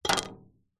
stone pebble drop against metal tank and land on concrete slab